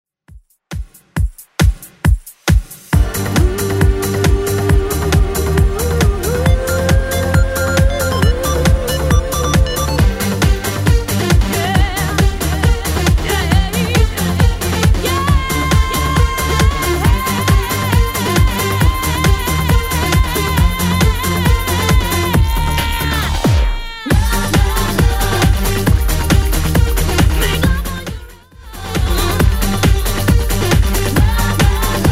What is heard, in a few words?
90s Redrum 136bpm